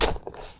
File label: fungus footstep; trees; dfcaverns